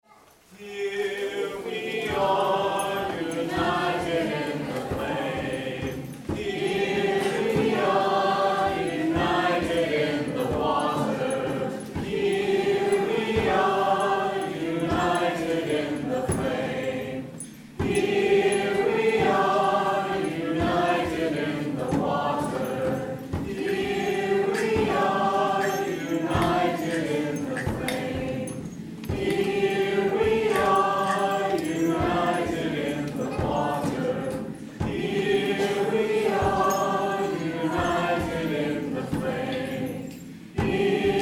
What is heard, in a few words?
chant